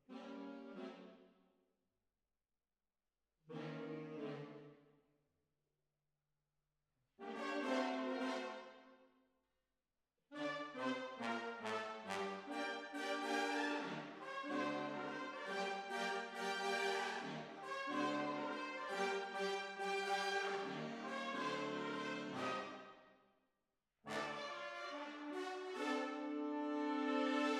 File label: Hall